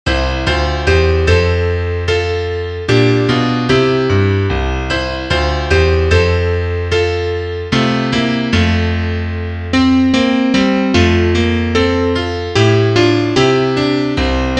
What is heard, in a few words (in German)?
Klavier; Liederbuch; kein schoener land